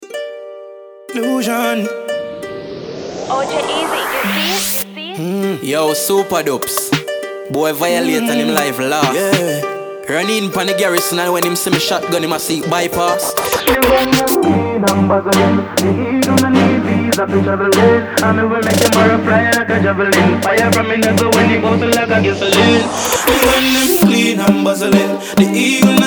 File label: Riddim